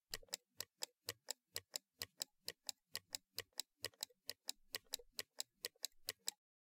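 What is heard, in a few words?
Low pitched Mouse Double, mouse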